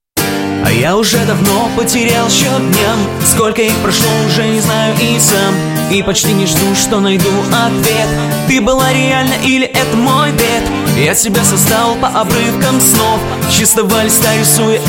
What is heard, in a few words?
Acoustic version